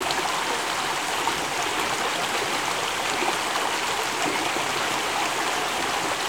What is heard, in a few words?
babbling, Ocean & Brook; SFX